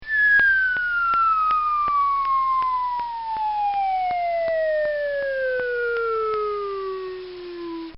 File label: Cartoon Sound Effects Falling